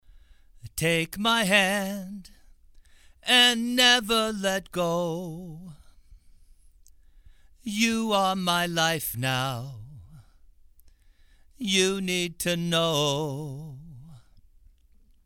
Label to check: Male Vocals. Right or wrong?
Right